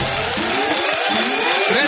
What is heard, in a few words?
cash winner; thenetherlands